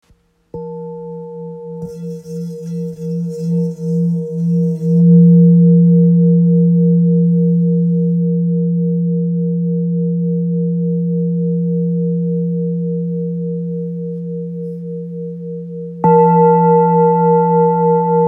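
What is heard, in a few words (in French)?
Bol ancien fa, bols chantants tibétains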